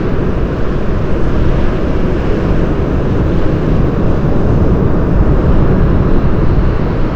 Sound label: sea